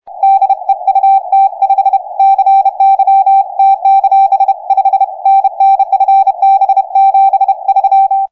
AGC; PFR-3A

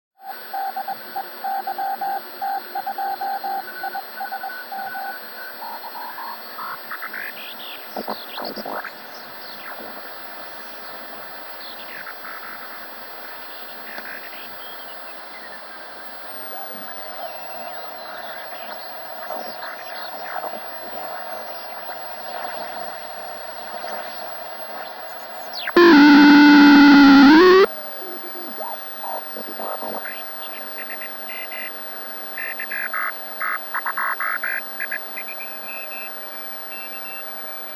regen

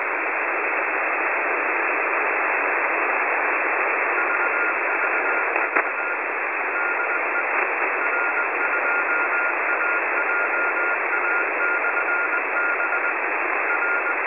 14063.0kHz

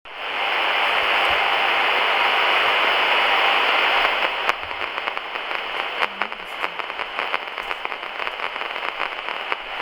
MY RX

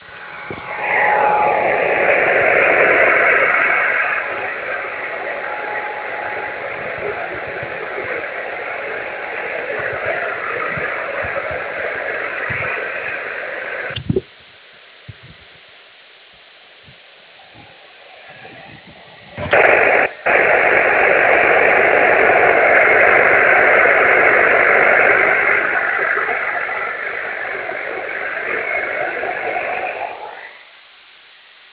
tone ml st mono

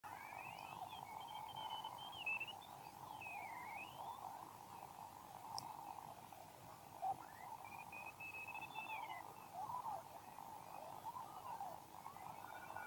regen 40m

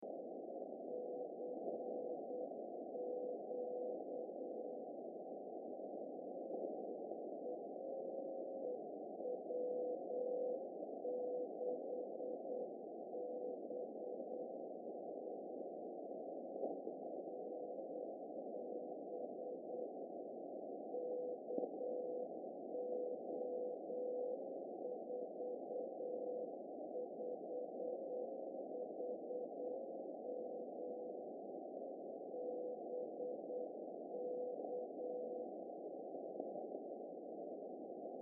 HDSDR 3561kHz AF

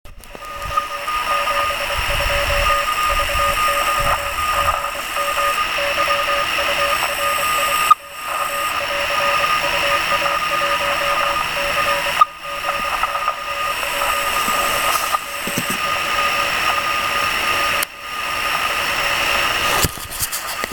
RX

